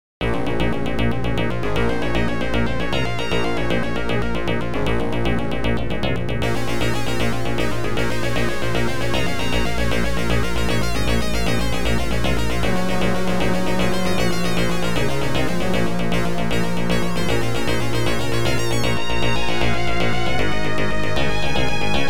OPL2; YAMAHA OPL2; Tyrian

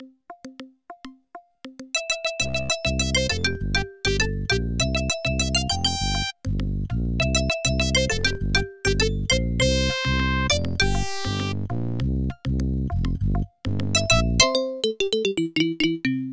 OPL3; sim city 2000

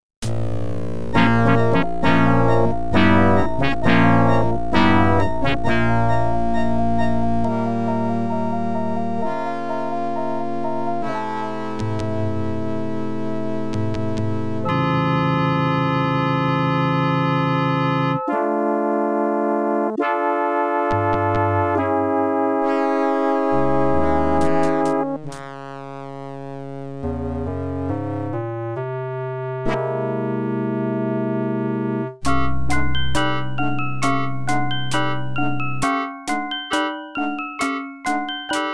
OPL2; X WING

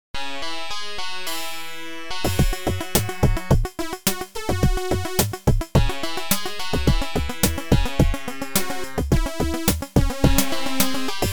ADLIB OPL2

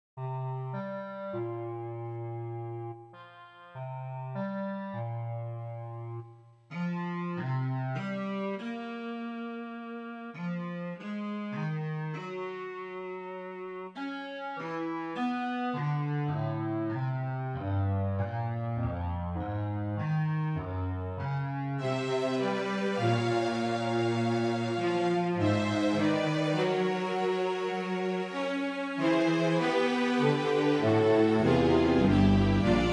SC880; QFG3

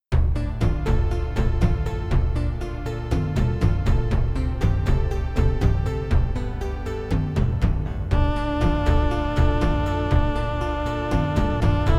CM500 MT32; ULTIMA6

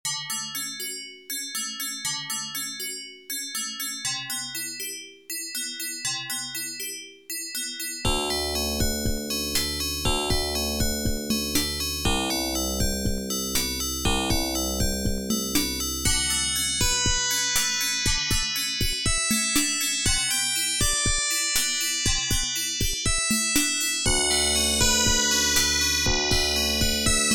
ADLIB OPL2

